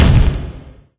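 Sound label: laser emitter impact large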